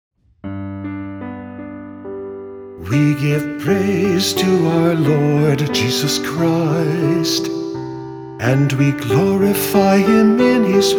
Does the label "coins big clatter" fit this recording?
No